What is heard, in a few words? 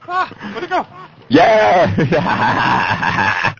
muhahaha